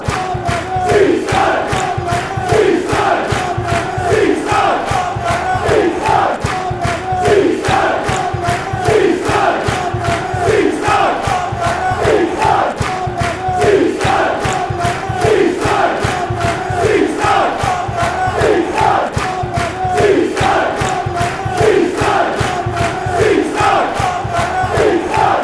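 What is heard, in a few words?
barmy army seaside, football songs and soccer chants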